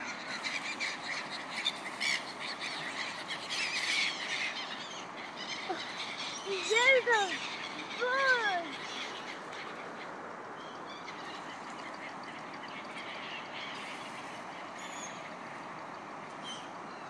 duck vs seagulls with commentary from 3 year old

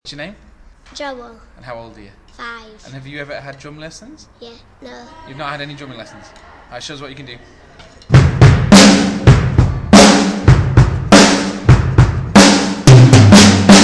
my 5 year old son drumming

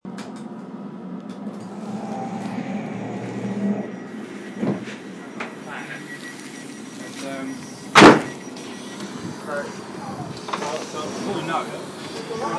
door

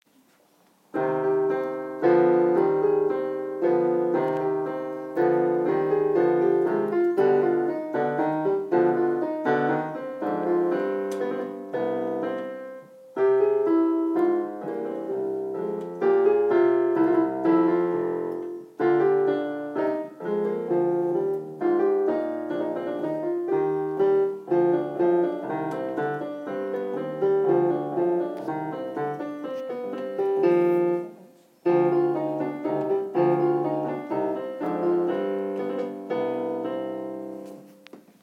piano solo

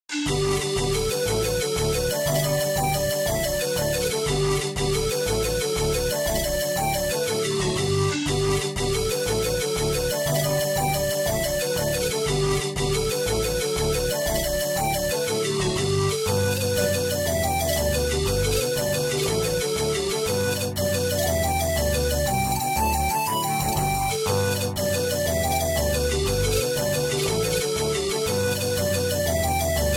the kesh jig traditional irish flute panflute; panflute mode; harmodion accordion; syntheway dal flute

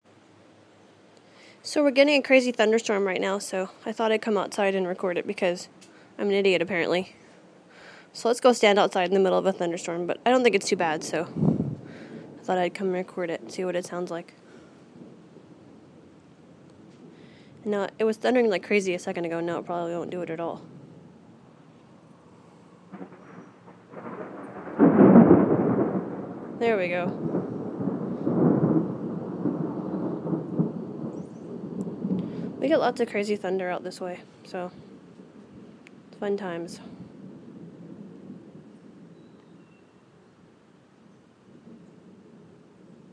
thunderstorm

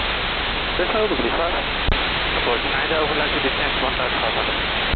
police approaching; eindhoven

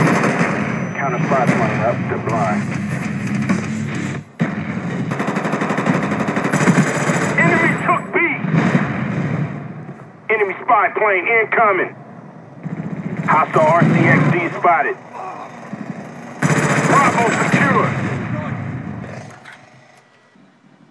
sounds of war gliss

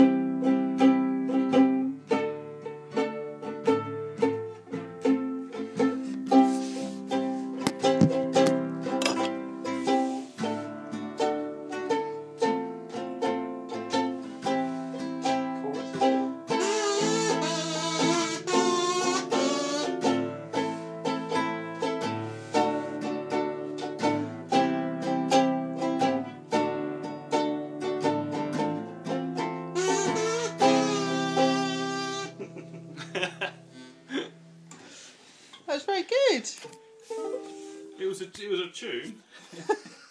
uke and guitar

